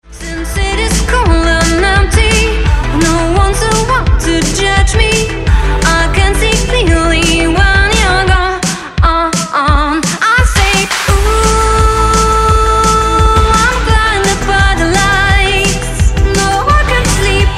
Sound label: 80ies Glam Pop Medley